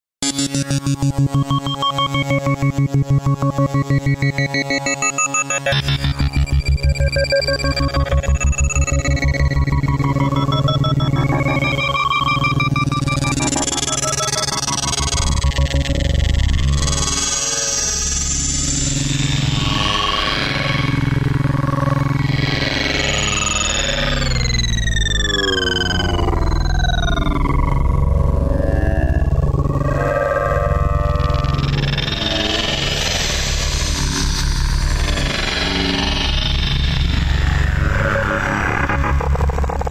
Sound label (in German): WALDORF IRIDIUM KEYBOARD Werksound